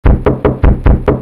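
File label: knock knock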